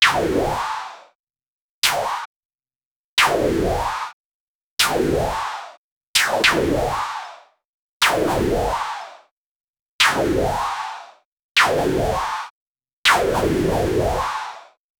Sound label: single shoot kar98